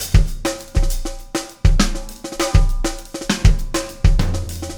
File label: Beat, Loops